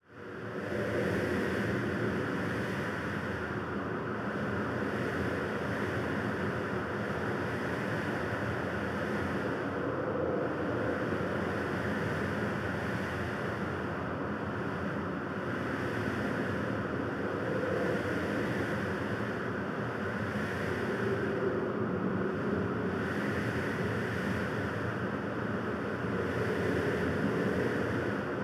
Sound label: env snow; monster hunter 4 ultimate